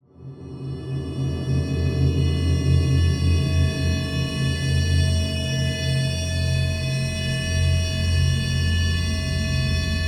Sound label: Drone Atmosphere Tension; DRONES; MatiasMacSD; THE ZONE DRONES